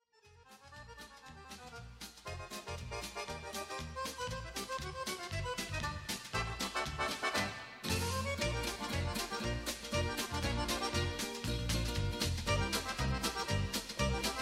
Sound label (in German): heiteres akkordeon